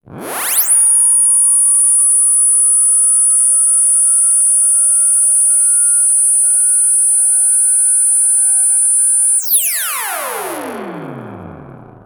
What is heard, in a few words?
FX, EMS VCS3